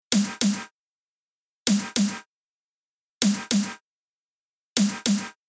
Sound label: Delay FB 0